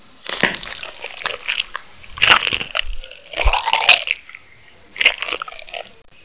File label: barnacle chew; gearbox